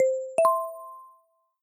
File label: chat new